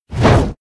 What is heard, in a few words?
Flapping